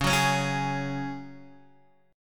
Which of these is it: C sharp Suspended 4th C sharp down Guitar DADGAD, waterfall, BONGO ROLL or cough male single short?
C sharp Suspended 4th C sharp down Guitar DADGAD